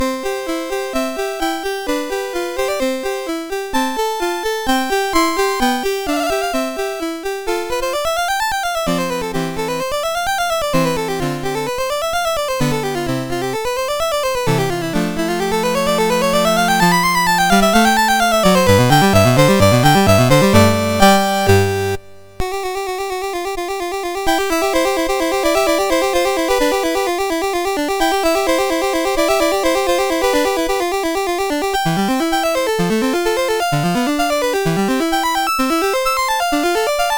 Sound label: Sonata, MOS6581R2; Sonata No3; Dave Burkus